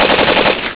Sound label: machine gun